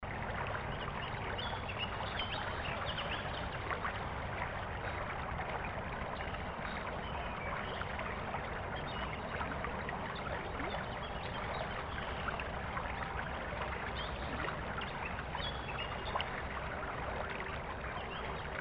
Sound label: River and Birds; Nature Sounds; Music On Hold Collection